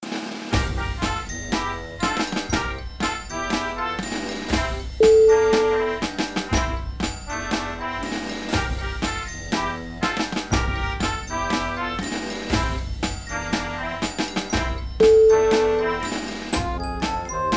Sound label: band camp